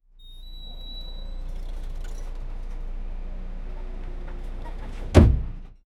old bus doors close

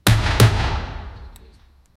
cheerleading basket toss; SFX Engine

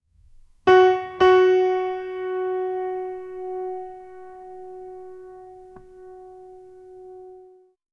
piano f g a f g a g a guitar

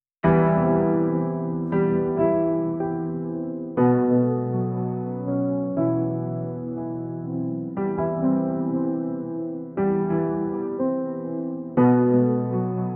soft piano clock sound; emotion

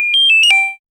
diamond collect video gam

